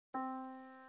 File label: yamaha Disk